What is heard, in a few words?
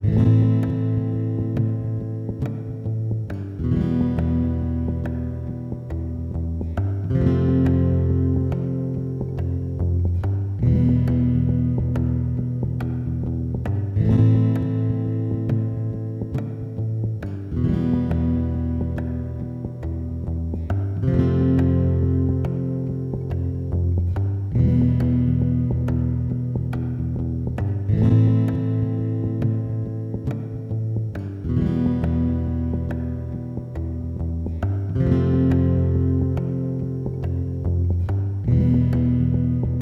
Broken Record Loop